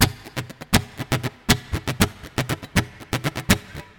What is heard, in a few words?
Guitar Muted Fret